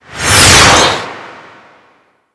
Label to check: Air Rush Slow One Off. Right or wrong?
Right